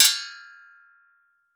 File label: Metal Ringout; DPA4060; Industrial Terror